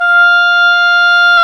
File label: oboe, Oboe Brth; Roland LCDP04 Orchestral Winds; Oboe Brth 1